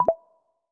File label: Lock; Honor X7a